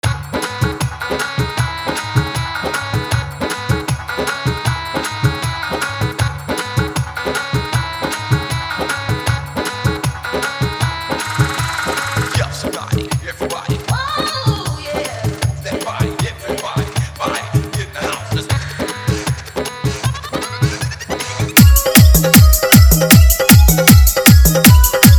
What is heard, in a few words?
Hard Dholki Bass Mix